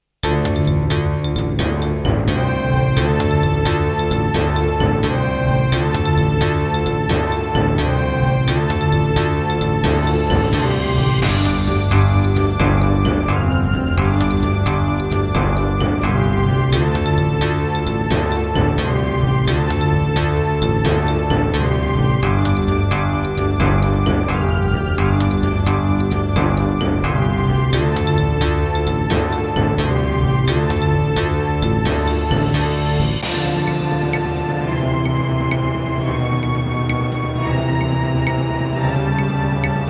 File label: Roland SC-8820 55MAP; Heretic